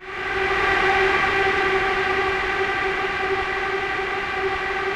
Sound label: DEEP PAD G4 R, Yamaha VP-1; DEEP-PAD 2; Music Store